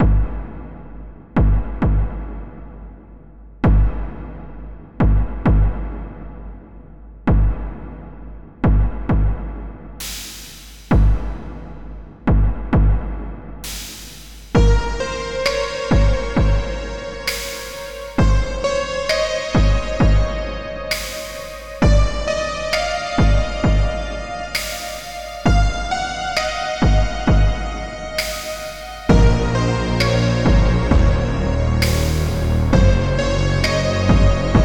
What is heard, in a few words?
Ambient Music Loop